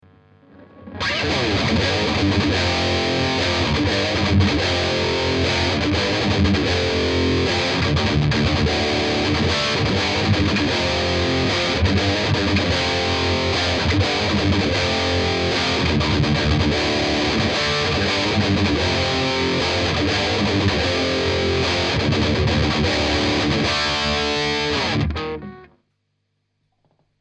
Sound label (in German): High Gain Les Paul Class AB Mikrofon